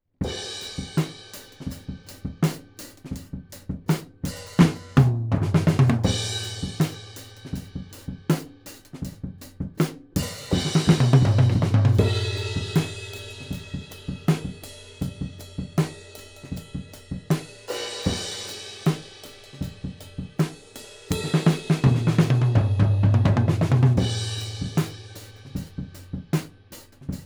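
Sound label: Drums Overheads Only; BM9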